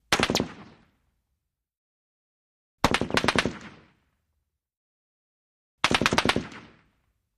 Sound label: AK47 Short Bursts Imp